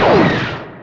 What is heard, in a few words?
telekinesis fire, weapons